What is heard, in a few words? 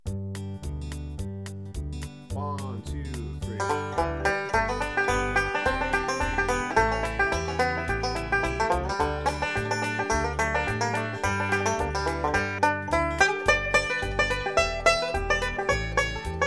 Salty Dog Blues, banjo